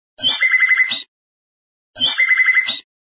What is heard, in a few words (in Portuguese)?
canto bicudo